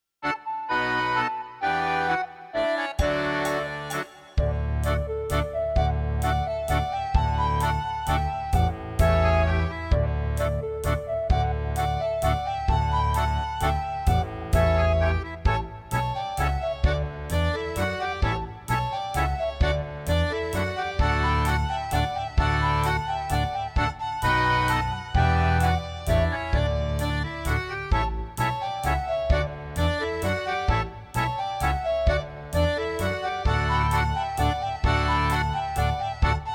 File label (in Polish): Dzisiaj w Betlejem instrumental